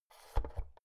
oven knob press out

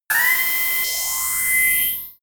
alarm

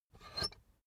puncher squeak push down

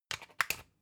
usb port metallic in

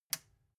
metal button release power on

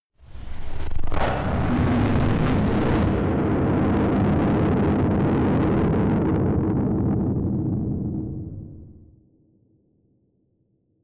distorted explosions

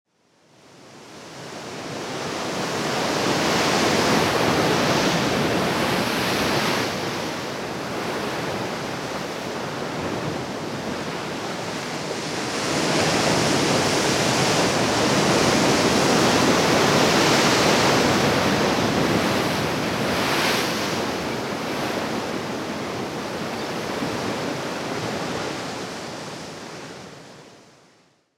large waves breaking

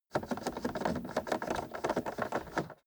car temperature knob turn long slow

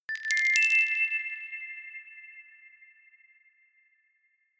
notification glockenspiel rattle triad up minor

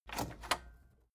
tape deck open

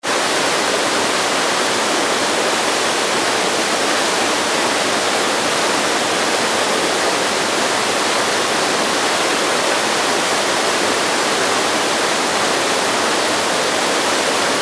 stream very strong

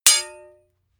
hammer hit metal hard

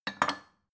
bowl shelve hard

